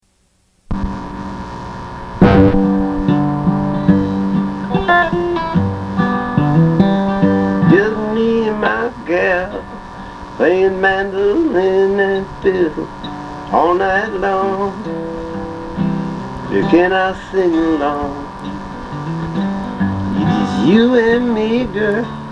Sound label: Blues